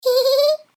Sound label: happy; Flashpoint; tobiidynavox; catchme